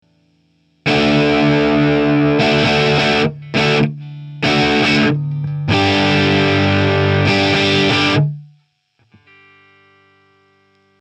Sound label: TC8210 Spring